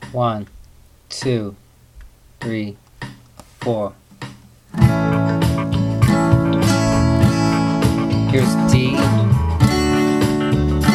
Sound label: blues strumming